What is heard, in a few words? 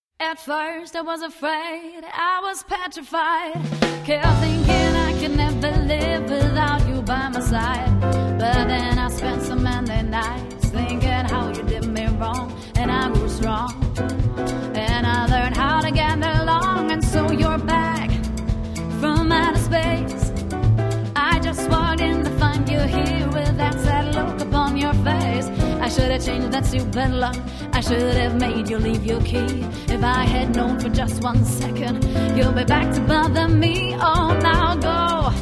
Pop 2 Jazz